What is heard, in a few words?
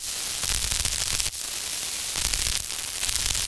Noise Stress Signal